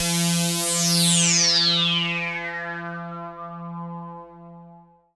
ATMO PADS; Best Service; XX Large

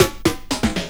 DRMROLL; E-Lab; X Static Goldmine 2; akai

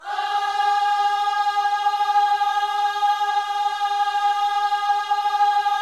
OHS G#4, Gospel; Spectrasonics; Vocal Planet Vol.1 Gospel; akai

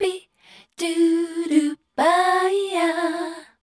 MELODIC, Voice Spectral; Best Service; Voice Spectral II

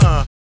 BD; E-Lab; X Static Goldmine 1

